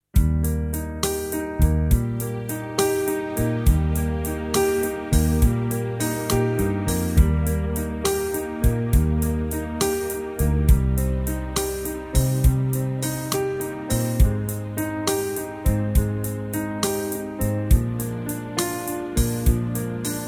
Eb

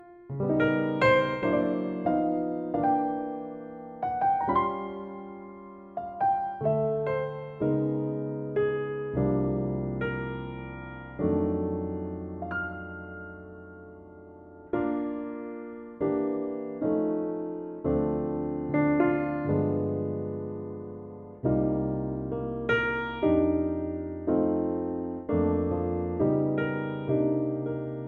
Bb piano

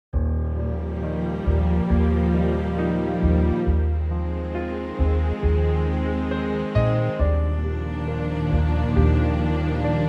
Eb ballad

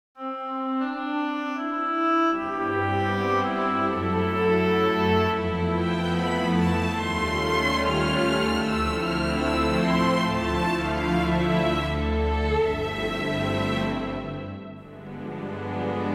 orch C

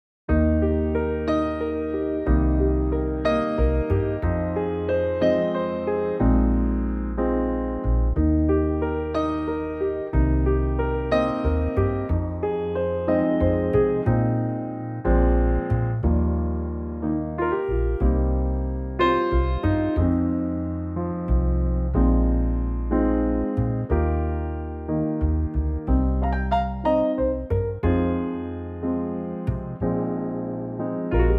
piano bass

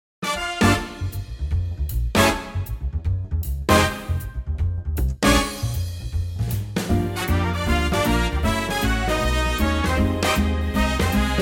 Eb Big Band

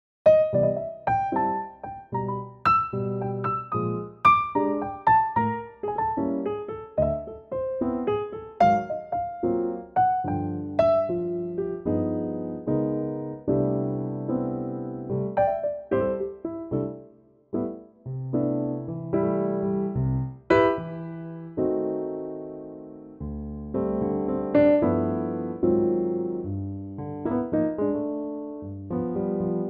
C piano

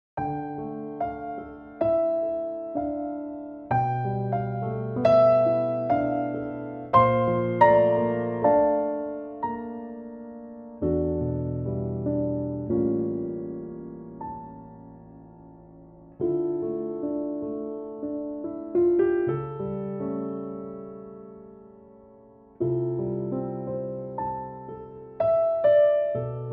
Dm piano

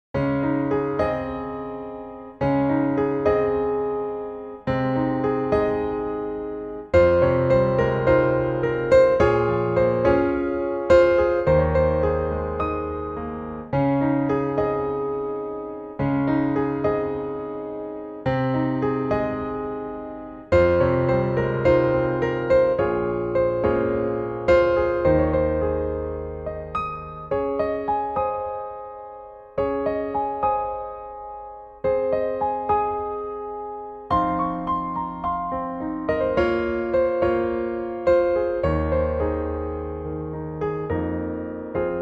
Ab piano trak extend

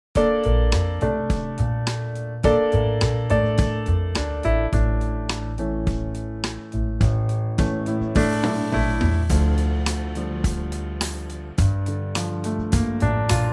trio Dm

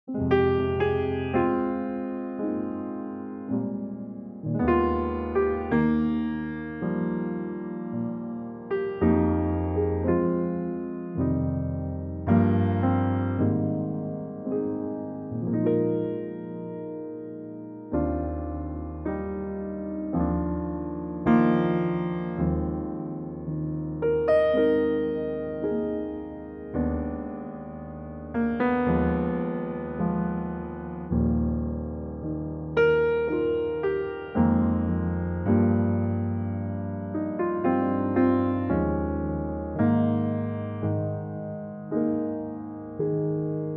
Eb piano trak

